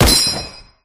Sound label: garand, guns